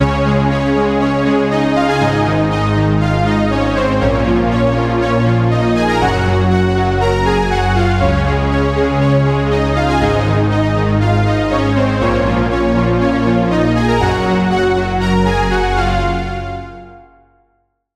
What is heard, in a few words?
Lead; Routed Sound; Sylenth1 Expansion - Star Scavenger Vol.1